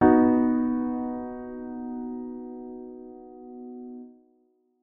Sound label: major triad blocked b